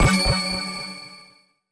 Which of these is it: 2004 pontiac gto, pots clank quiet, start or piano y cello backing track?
start